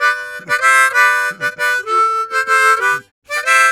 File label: Harm 129bpm G, Harmonica